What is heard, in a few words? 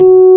EPF F#3; AKAI S-Series CD-ROM Sound Library VOL-2